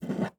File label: Medicine Glass Jar; Medical; Blastwave